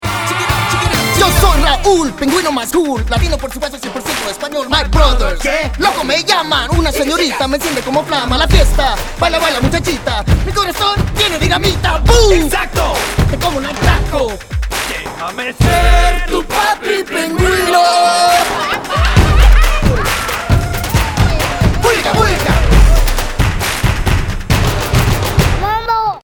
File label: Adelie Rap